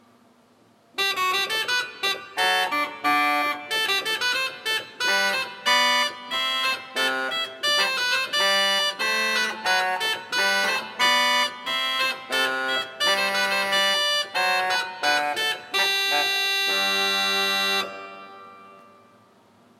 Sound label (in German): regal, orgel